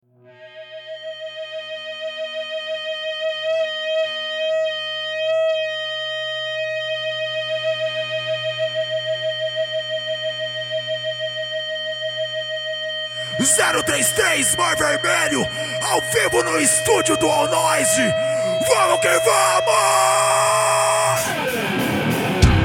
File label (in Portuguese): ao vivo no estúdio